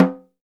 Snare Drum; Percussion Samples

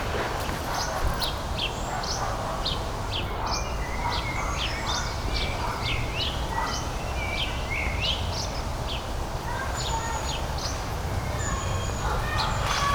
Birdsong Lawnmower Bouncing Ball Children; Animals

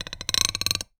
Bolt Base Rub, Medium Wine Glass

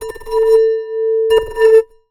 Bowed, Large Wine Glass

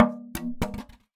Polystyrene Rattle

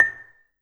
Gambang Hard Beater, Gamelan

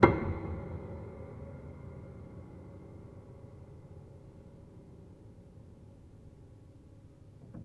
Hamm Perc Ped, Piano Hammer Perc Ped; Piano Miscellaneous

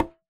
Polystyrene Knock

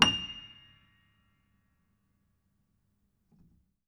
Note High, Piano; Piano Miscellaneous

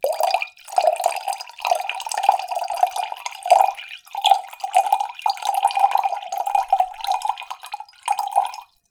Dribble, Water Dribble

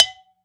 Flower Pot Hard; Flower Pots

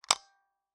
Taxi Meter

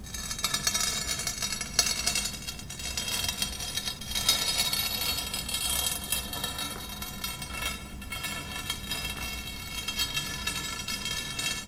Digging Bar Drag

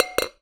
Ricochet Glass, Medium Wine Glass

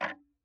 Tick, Clocks Kitchen Clock

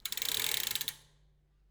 Back Pedals, Bicycle

